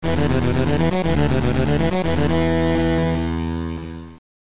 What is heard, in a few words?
Gameover; 1000SPIKES